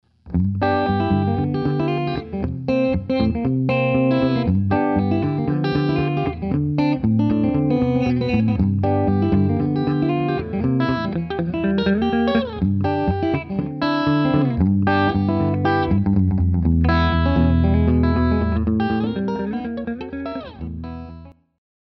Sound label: hollowbody chicken